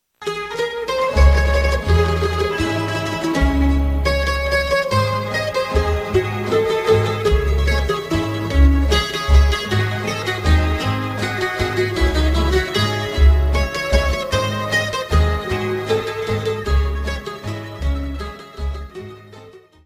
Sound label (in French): Carinosa - Philippines